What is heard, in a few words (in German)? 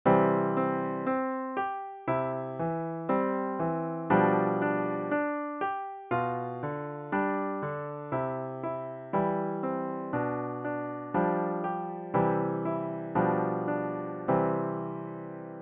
Allegro, Klavier; Tuerk